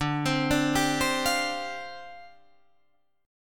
D Minor 11th D down Guitar Drop D

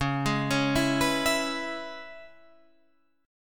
C sharp Minor 7th C sharp Guitar Standard; C#m7; arpeggiated; JGuitar

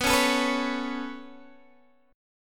B 7th Suspended 2nd B down Guitar DADGAD; B7sus2; strummed

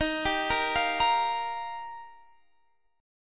D sharp Major Add 9th, Guitar Standard; arpeggiated; D#add9; x,18,17,15,18,18; JGuitar

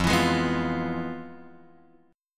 F Minor Major 7th Flat 5th; FmM7b5 Chord {1 X 2 1 0 1} strummed

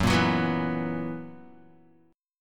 Gb 11th Gb down Guitar, Gb11 chord; Gb11 strummed; JGuitar

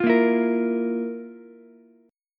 F Major 7th Suspended 4th F, Ukulele; FM7sus4 strummed; JGuitar

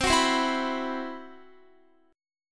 C Major 7th Flat 5th C, Guitar; CM7b5 strummed

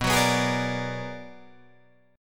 B-Minor Major 13th B down Guitar DADGAD; BmM13 strummed

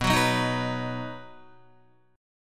B Major, Guitar, 4F,4A,5C,5G,5C,6E; strummed; 6,9,6,8,6,7; JGuitar

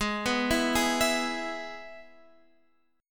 Ab Minor Sharp 5th Ab, Guitar Standard; arpeggiated; x,11,9,9,9,12

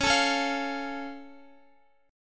C sharp Augmented down Guitar, chord; strummed; tuning=4E,4A,5D,5G,5C,6F

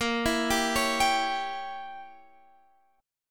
A sharp Diminished 7th, Guitar Open F; arpeggiated; chordshape

